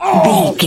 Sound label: voice male hurt pain